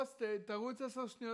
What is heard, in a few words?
TB GTR